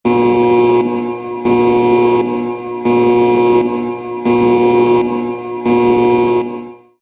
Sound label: Battleship Alarm Navy